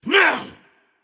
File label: grunt attack; Smite; baronsamedi